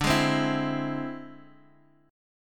E Augmented 7th D

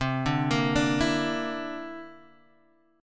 D 7th Suspended 2nd Sharp 5th C

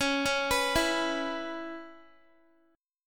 C sharp Minor 7th, Guitar; C#m7; arpeggiated

